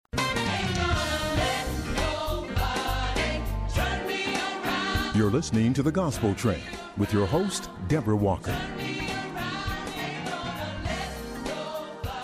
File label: gospel train; Weekly Radio Programs; New Year's